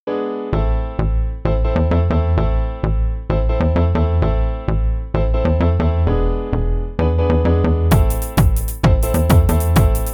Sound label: rhythm 5-3-4